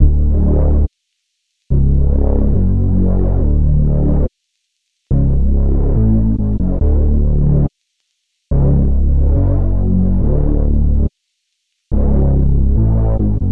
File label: Bass